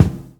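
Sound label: bass drum funk, drum kit; E-Lab; X Static Goldmine 2; akai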